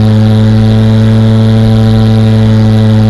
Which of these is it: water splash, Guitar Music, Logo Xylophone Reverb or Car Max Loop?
Car Max Loop